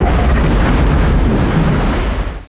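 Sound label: EXPLODE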